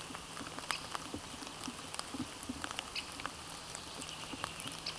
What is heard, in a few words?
Dendrocopos major